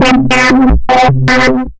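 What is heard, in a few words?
Sample and Hold Bass, Synth Rhythmic; SAH Bass; Creative Extensions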